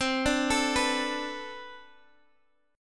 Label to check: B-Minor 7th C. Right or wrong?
Right